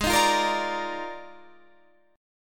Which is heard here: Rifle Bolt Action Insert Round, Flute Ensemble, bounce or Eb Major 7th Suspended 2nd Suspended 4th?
Eb Major 7th Suspended 2nd Suspended 4th